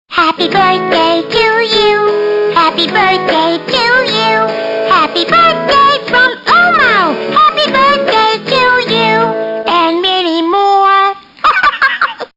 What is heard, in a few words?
SATB